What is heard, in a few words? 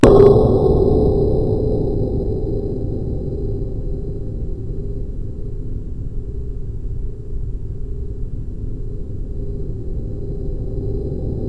ABYSS